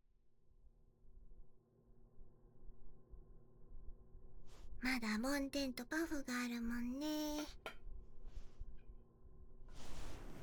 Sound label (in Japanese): 梵天とベビーパウダーでぽふっと; しろなのお家コース